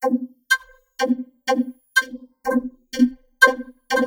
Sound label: Perc Intro